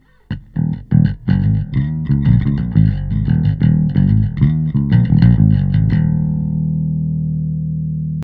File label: Bass Thumper; PHYSION Mk II; bass preset